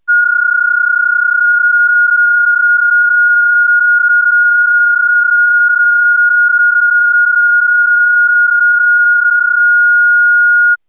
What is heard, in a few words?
qpsk31